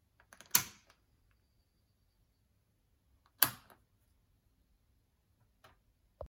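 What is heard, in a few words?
hood light on off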